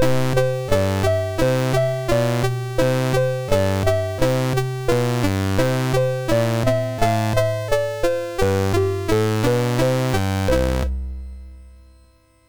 sid MOS6581R3

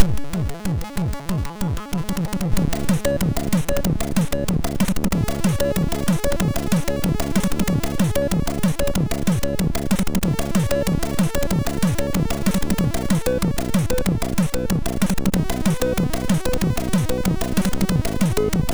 sid MOS6581R2

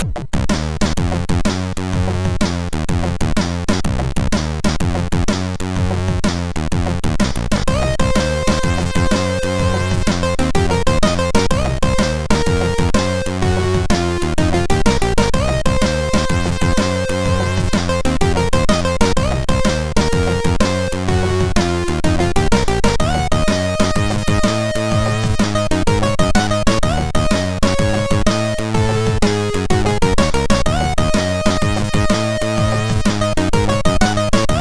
Stuemp Like, MOS6581R4; sid

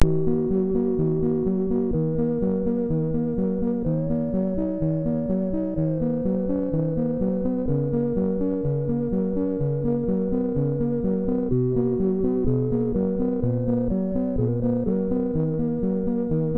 Depressing Time, MOS6581R2; sid

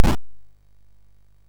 sid MOS6581R3; Gremlins 2-The New Batch

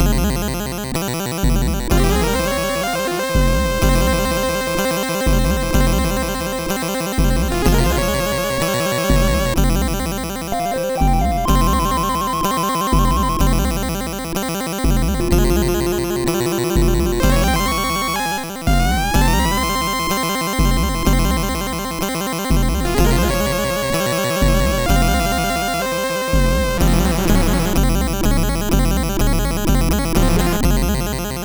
sid MOS6581R4

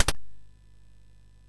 sid MOS 6581R2

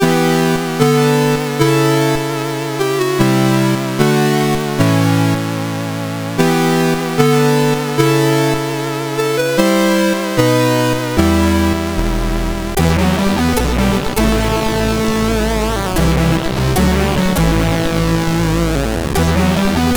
sid CSG8580R5

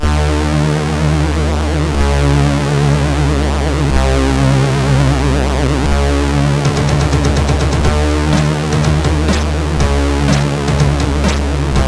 sid CSG8580R5

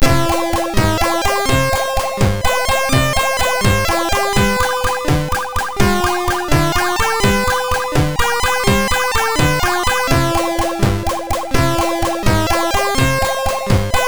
sid MOS6581R2; Fiendish Freddys Big Top O Fun

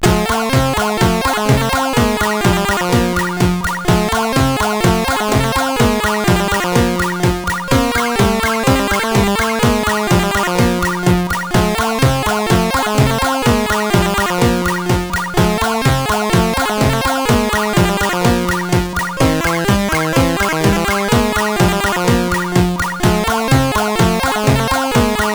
sid CSG8580R5; Fiendish Freddys Big Top O Fun

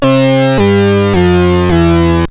sid CSG8580R5; Fiendish Freddys Big Top O Fun

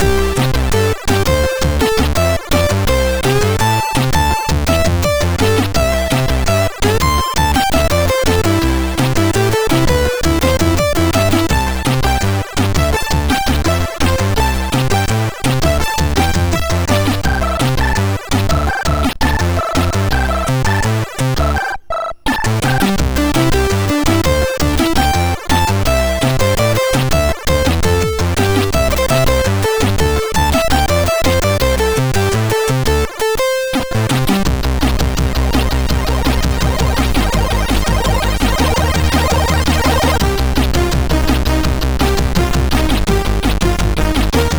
sid MOS6581R3

